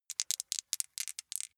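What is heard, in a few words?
small two rattle in hand, dice; wuerfel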